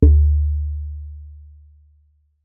Jembe; Percussion Samples

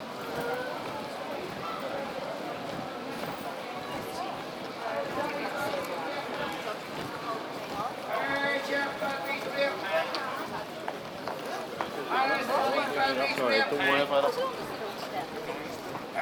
Castle Lane Belfast; Sound Scapes

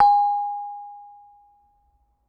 Kempyang, Gamelan

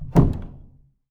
Panel Lock In Place, Piano; Piano Miscellaneous

